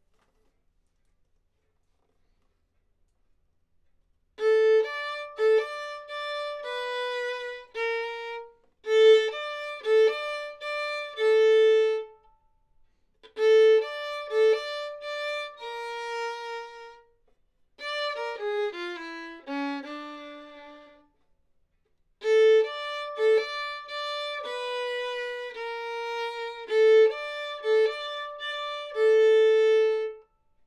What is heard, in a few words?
violin